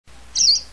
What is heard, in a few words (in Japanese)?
saezuri pii, mejiro